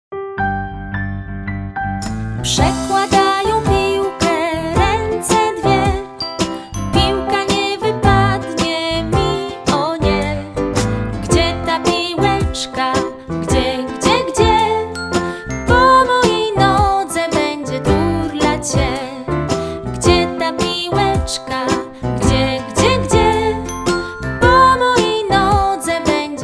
Pilka II wok.; pakiet pilka

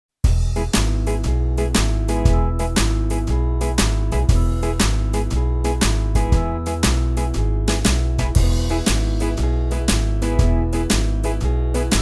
WERSJA INSTRUMENTALNA

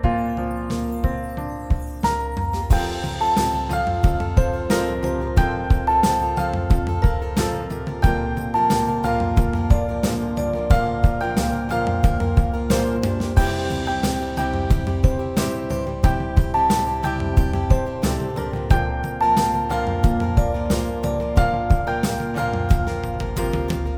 wersja instrumentalna

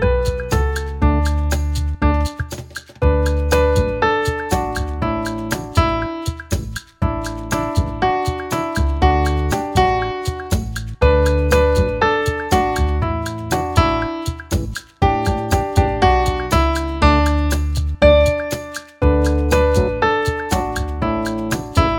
Tancz chusteczko instr, WERSJA INSTRUMENTALNA

